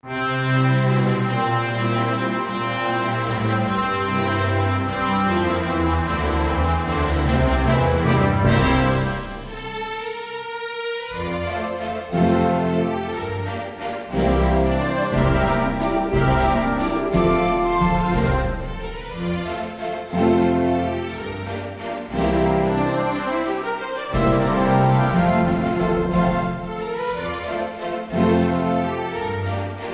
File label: Wiener Waltz